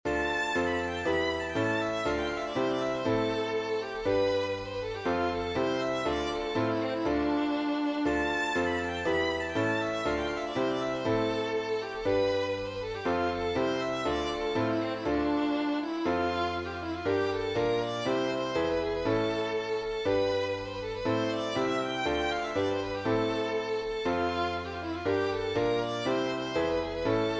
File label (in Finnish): sottiisi